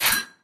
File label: editor panel lower, ui; Source Engine; Portal 2